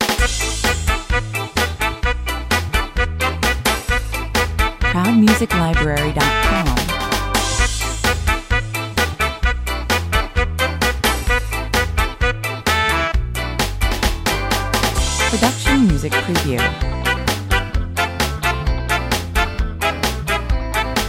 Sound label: a minor ska 130 bpm